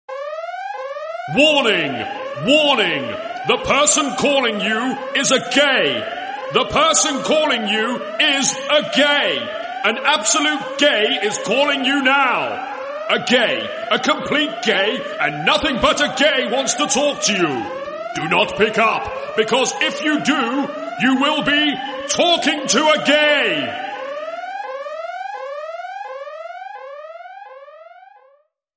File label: warning